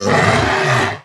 Hippogryph Attack

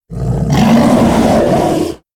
Doomkin Attack

